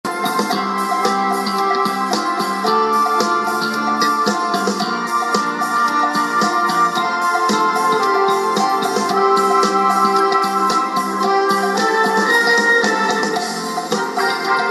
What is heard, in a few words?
instrumental on Iranian state radio